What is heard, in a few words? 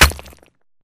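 Bullet Light Ballistic Concrete impact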